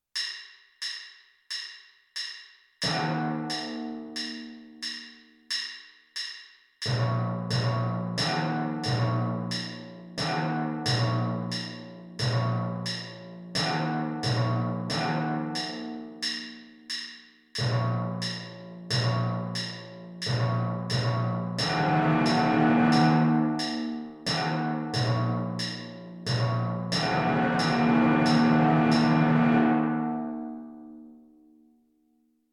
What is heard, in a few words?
major scale timpani